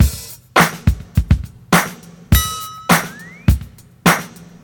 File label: 103 bpm fresh drum loop sample c sharp key